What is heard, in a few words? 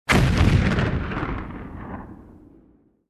Large explosion or cannon in a close perspective